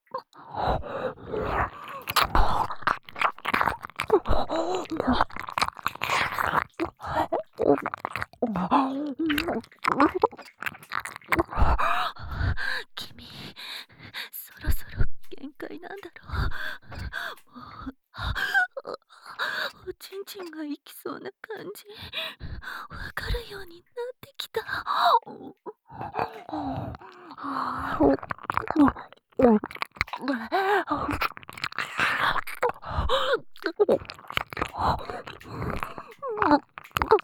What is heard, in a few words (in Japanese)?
耳舐め; 抜きトラック